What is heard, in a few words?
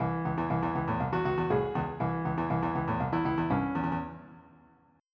Funk